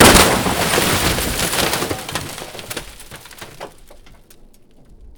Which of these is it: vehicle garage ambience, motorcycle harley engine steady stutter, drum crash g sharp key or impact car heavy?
impact car heavy